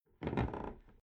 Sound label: Household Old House Wooden Floorboards Creaky Squeaky Eerie; Creaky Door; Tom Hutchings